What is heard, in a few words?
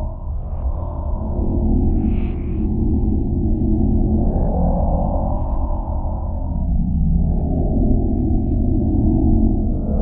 Timestop Loop; Master Shan